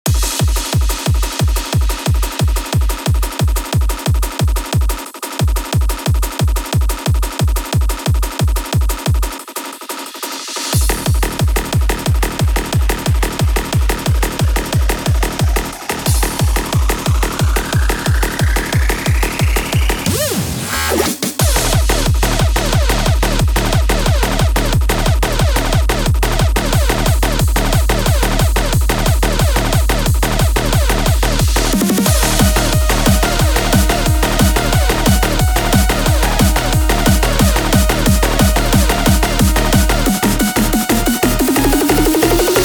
Instrumental

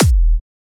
Kick

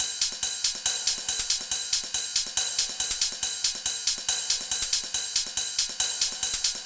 140BPM Hi-Hats Loop

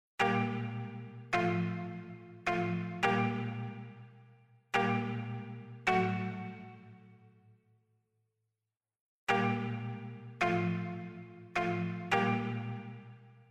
Ambient Ver.

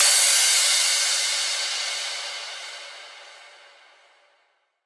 Crash

